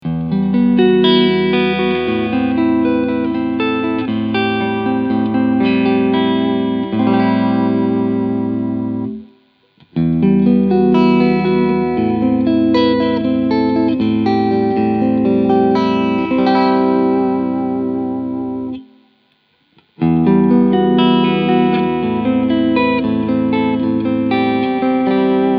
Fender American Ultra Stratocaster Cleansound alle Pickups ohne S1

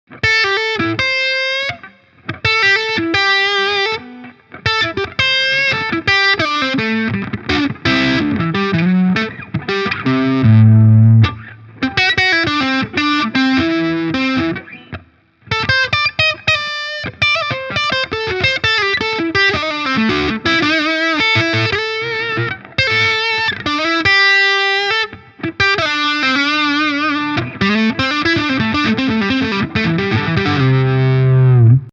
Harley Benton Guitar Kit Hals verzerrt

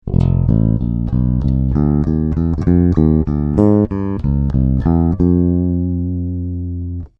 Corvette Hals Parallel

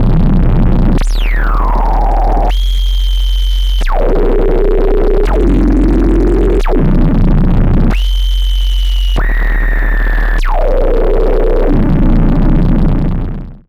Error Instruments Drums Liquid Glitcher Tonhöhenänderung

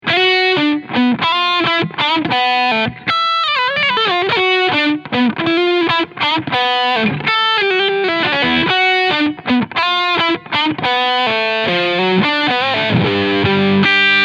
PRS SE McCarty 594 Vintage SB Leadsound Steg Humbucker

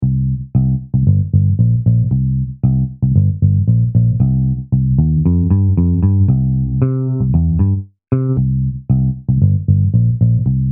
Bass Original

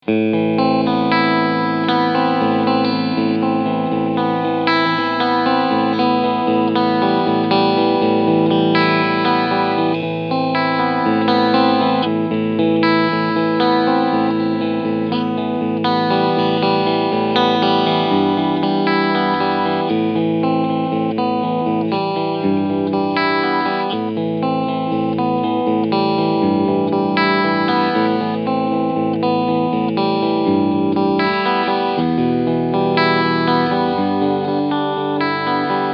Cleansound Mitte Steg

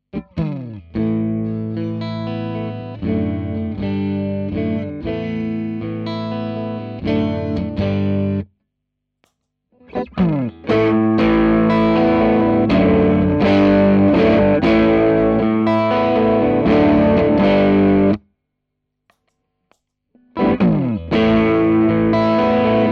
12 Uhr Gain 12 Uhr Tone STOCK different Dipsettings

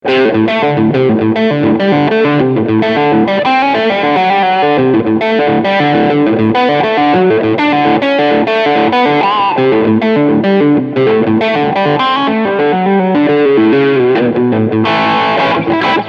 Riff Low Gain MIT Hall

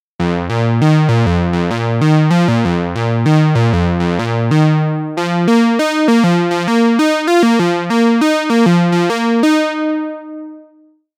Sägezahn Rechteck A

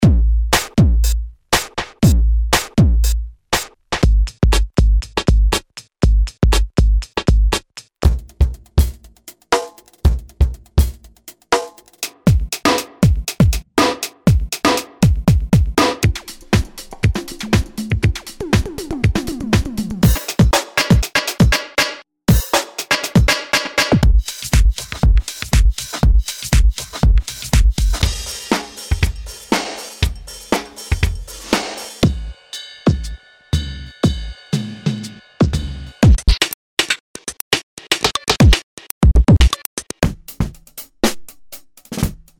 Klangbeispiel BPM 120

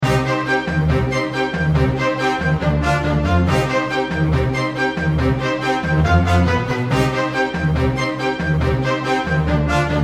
Multiband Kompressor

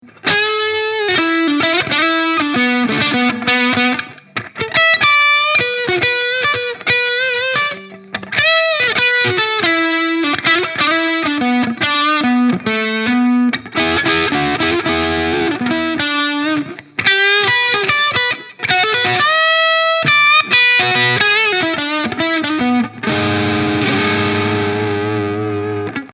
Halstonabnehmer verzerrt

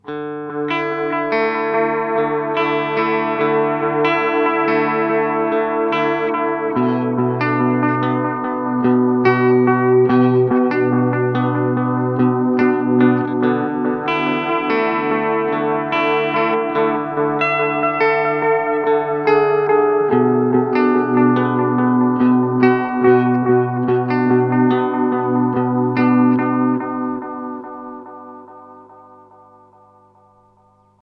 Echosex 3 Modulation auf 12 Uhr; Gurus

